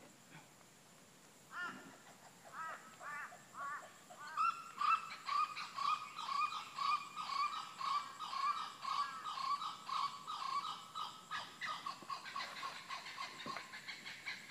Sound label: blue winged kookaburra